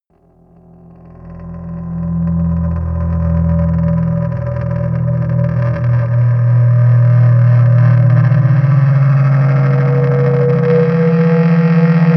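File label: ghost ship, pads; flaechen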